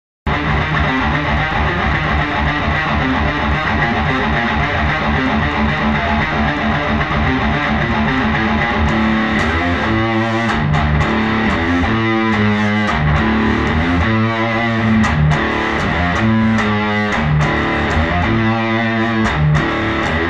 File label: DIGITECH DDM mixer out; pedals